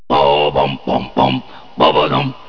bumbadum

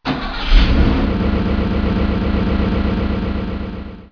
car start

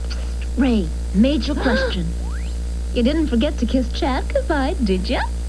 kiss; "Rae major question, you didn't forget to kiss Chad goodbye did you?"

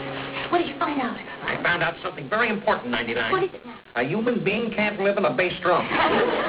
drum

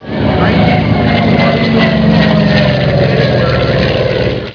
F6F flyby

